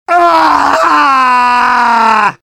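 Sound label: demoman pain critical death; svencoop addon; tfc; player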